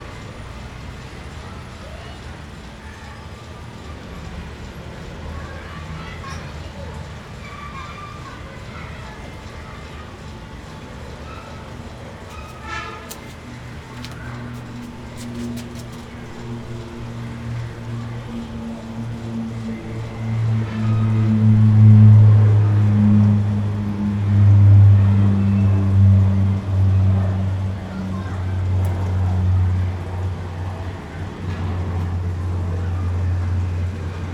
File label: Boudha Road Boudha walk, fieldrecording, Kathmandu, Nepal